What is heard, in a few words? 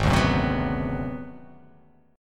G sharp 7th Sharp 9th Flat 5th; strummed; chord; JGuitar